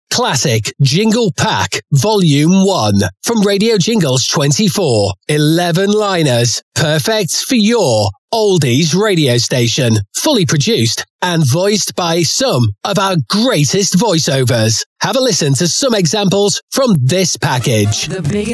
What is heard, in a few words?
Jingle; Jingle Pack Demos